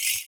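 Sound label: PERC, percussions; Univers Sons - Raricussions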